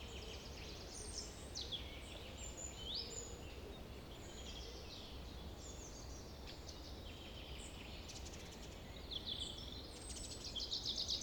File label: Forest Summer